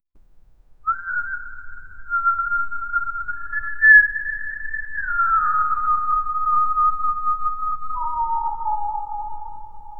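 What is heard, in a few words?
slow haunting human whistle, breathy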